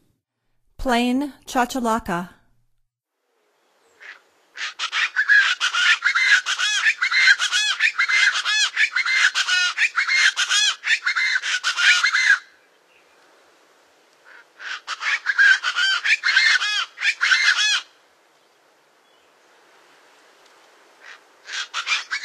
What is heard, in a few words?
Plain Chachalaca; North America; Stokes